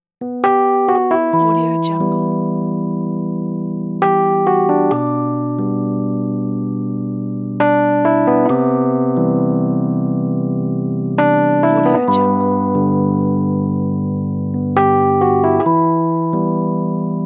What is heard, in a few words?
ambient sentimental